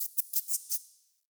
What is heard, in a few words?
Under City Tiny Creature